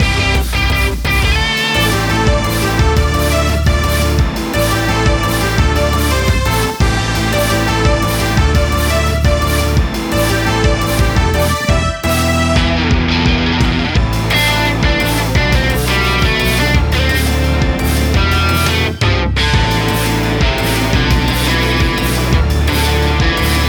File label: beats bpm172